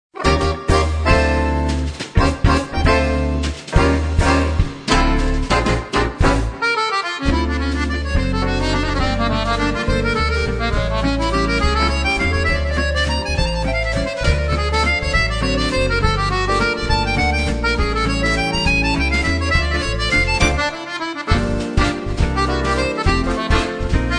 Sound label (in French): valse et jazz